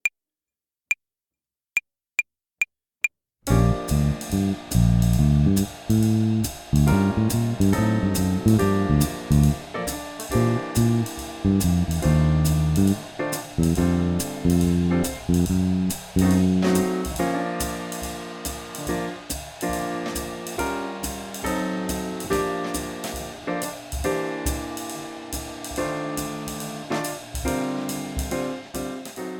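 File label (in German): Swing Phrasierung Übung; play along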